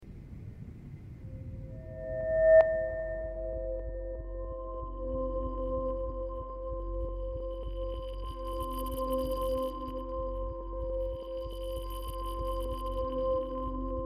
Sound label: zoom in on foto; life is strange